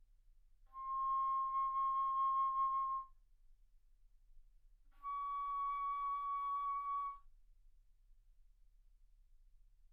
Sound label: Bass Flute ff C6 Db6